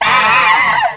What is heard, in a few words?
headcrab attack; svencoop